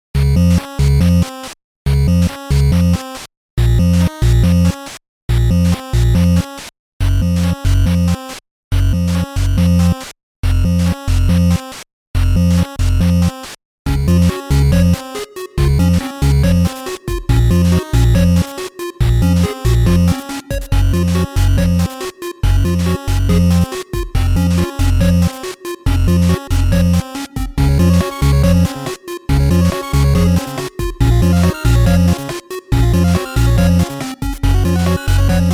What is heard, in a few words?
8-bit; Lenna's Inception